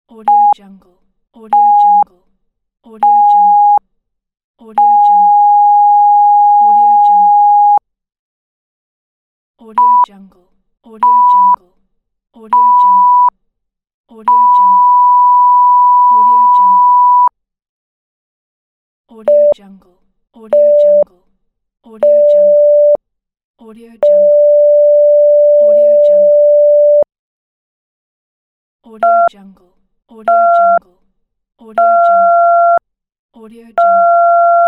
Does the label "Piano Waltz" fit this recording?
No